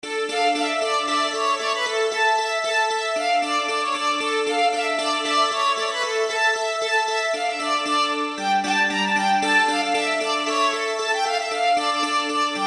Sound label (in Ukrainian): Polka z smykom Volyn